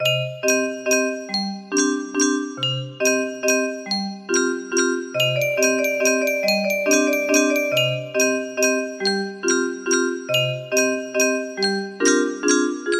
waltz, music box melody

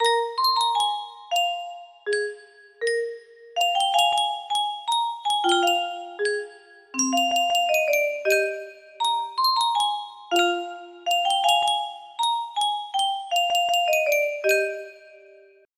caja de musica, music box melody

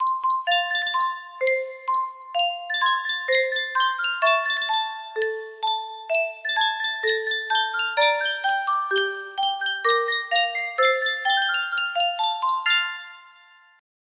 sankyo music box

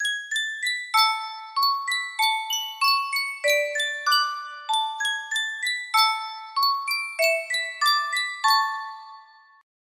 sankyo miniature music box

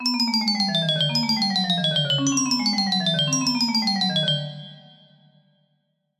music box melody

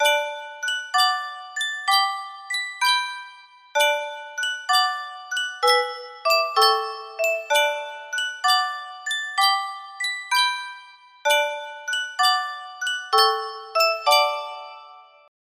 music box

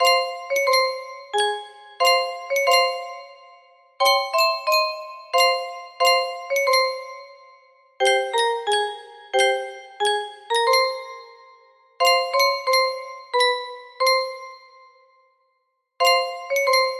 melody

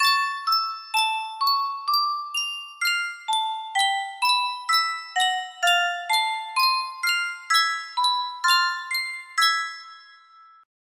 Sankyo Miniature Music Box, music box melody

